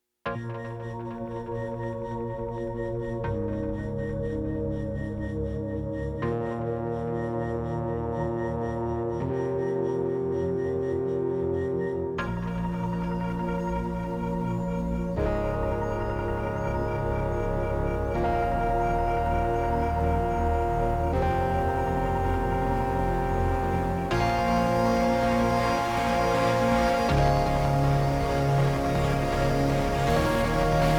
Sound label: No Grind Synth; Ninja Tracks